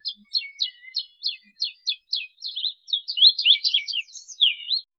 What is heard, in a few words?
bird sing soft spring